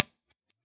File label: 4x12 G12H-150 MD421 2.0in; IRs